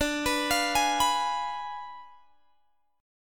D Diminished 7th D, Guitar, Open G

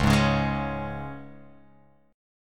C sharp 5th C sharp, Guitar, Open F; strummed; C#5; JGuitar

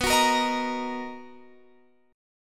B Major 7th B down Guitar Open F; strummed

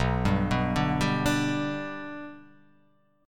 B-Minor Sharp 5th

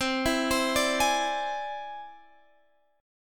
E Augmented 7th C, guitar

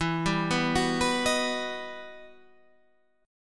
E Minor Major 9th E

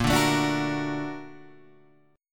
A sharp Augmented Major 7th

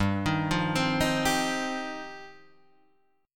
G 7th Suspended 4th Sharp 5th; G7sus4#5 arpeggiated

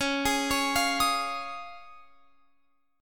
Db Major Add 9th, Guitar Open F; Dbadd9; arpeggiated

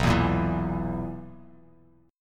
B Major 7th Suspended 4th B down Bass Standard; BM7sus4; strummed

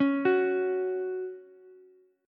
F sharp 5th, Ukulele; arpeggiated; x,x,9,9; JGuitar

